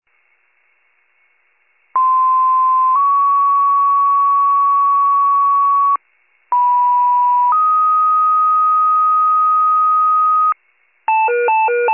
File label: fire, pager alert